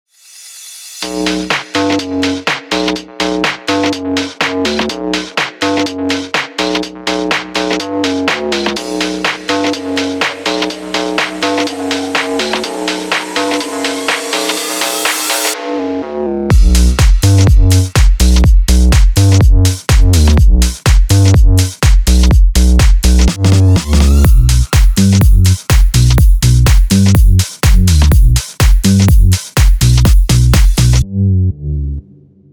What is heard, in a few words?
analog heat; iceberg audio